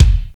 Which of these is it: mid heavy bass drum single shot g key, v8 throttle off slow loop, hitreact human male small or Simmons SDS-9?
mid heavy bass drum single shot g key